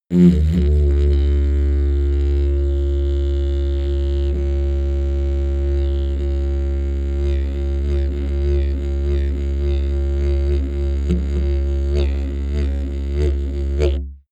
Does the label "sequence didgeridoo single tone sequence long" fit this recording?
Yes